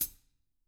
HTRA FOOT, Drums, TAMA; ILIO; Double Platinum Drums 1; TAMA+TAMA

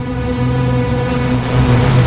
FX Loop, Drums & Drones

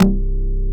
Korg CX3, C 1

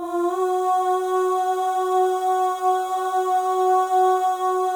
OH, FEM, Symphony of Voices; Spectrasonics; FEM.1 OHS

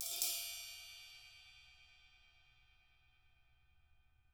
CYMBAL RUFFS

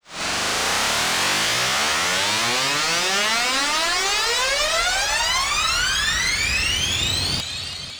Long Riswer

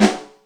Fatbrass, Yamaha DTXpress, Acoustic Snare

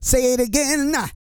SING, Vocal Planet R&B & Dance; ILIO

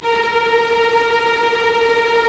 STR, Roland LA Composer; Roland - LA Composer vol. 1

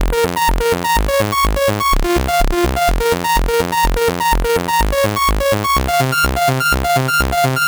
moving in pairs F 125, synth loop; Zero-G; Soundclash; Nu Dance Electro

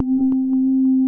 FILTER RINGS, TECHNO; Pro-Rec - Synthsonic; Z1 TECHNO

